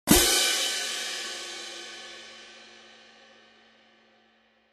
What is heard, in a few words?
20in Symphonic Viennese Pair